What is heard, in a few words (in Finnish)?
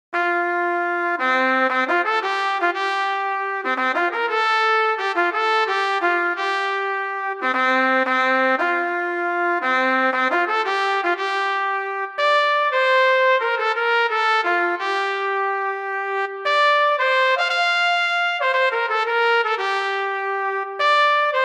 Rotissöörit 116 tempo